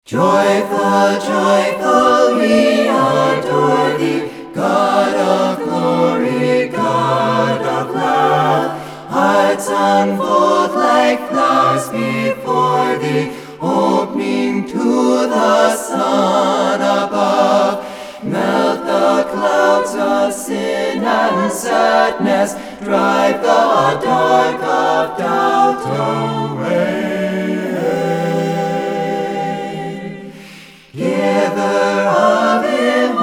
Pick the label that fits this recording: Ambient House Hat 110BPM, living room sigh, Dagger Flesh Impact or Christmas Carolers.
Christmas Carolers